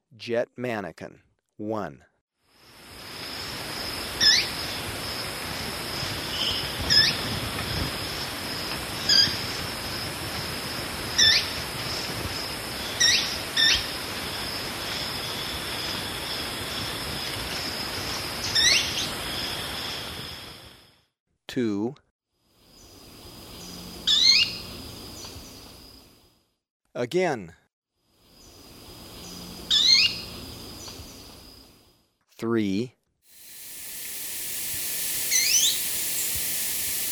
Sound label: Jet Manakin, Birds of Ecuador; S. America